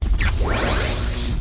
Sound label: box open